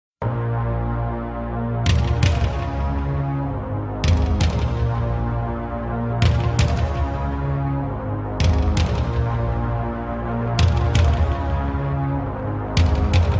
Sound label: Instrumental